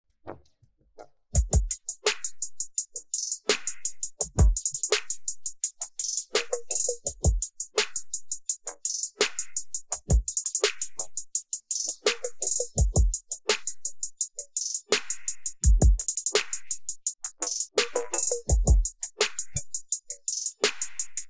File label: Drums